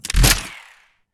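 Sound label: bird arrow, attack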